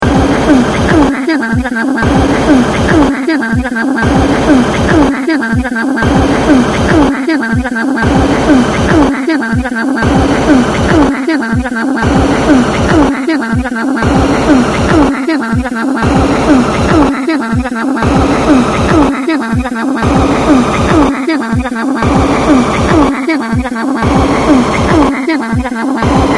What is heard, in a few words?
loop